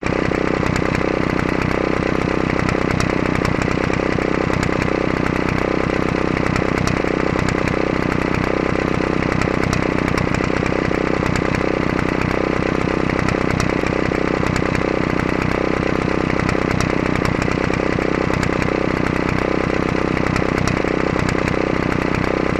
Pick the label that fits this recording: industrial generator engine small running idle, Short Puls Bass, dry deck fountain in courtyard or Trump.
industrial generator engine small running idle